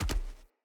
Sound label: Battle Dog Land Veg, Footsteps; Snc Battle Dog; Foley